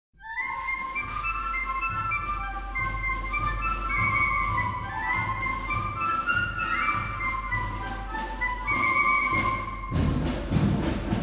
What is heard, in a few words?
Yankee Doodle Drums